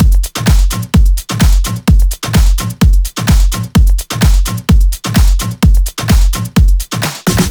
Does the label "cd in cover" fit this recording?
No